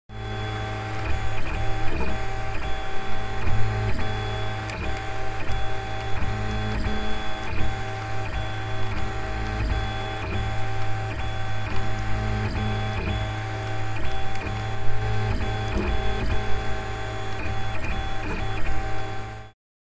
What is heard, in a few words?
3D printer printing a sequence